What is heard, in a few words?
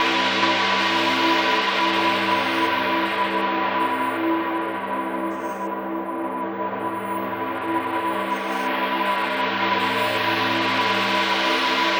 80 F Ambience; Ambient Synth Loops; Aerosol